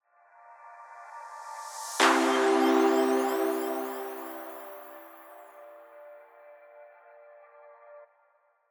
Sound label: Logo Crescendo; Quinte Hearing Centre